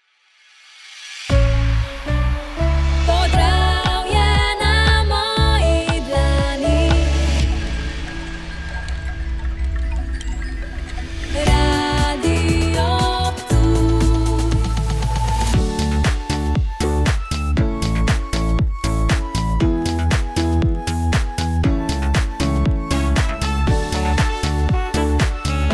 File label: Show Opener; Jutro